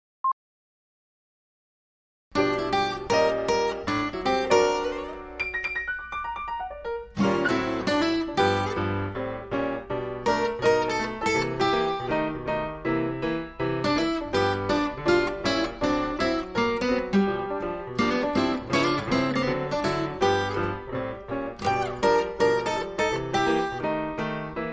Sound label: Blues